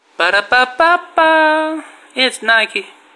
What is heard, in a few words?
Jingle